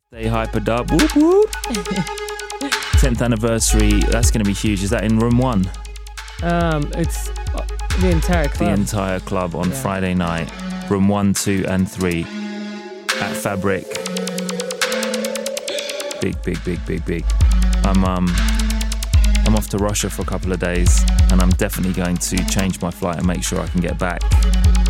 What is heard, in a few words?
flute gasp